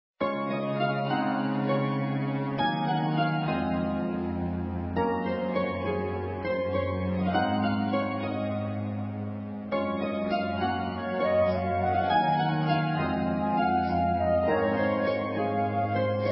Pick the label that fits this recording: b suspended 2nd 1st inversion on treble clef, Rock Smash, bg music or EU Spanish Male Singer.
bg music